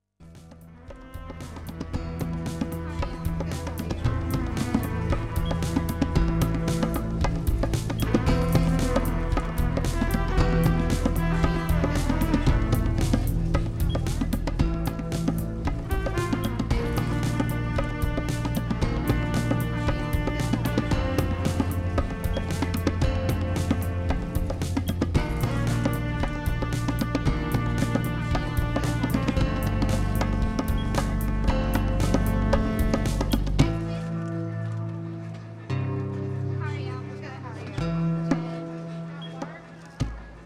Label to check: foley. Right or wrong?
Wrong